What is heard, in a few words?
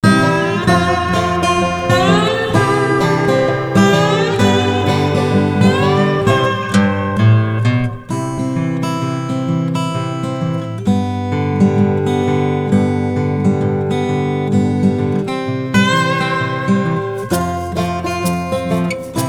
Instrumental